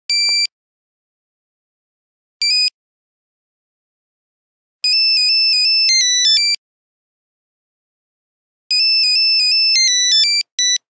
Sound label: 3410, Nokia Buzzer; Dawn; Windows Phone apps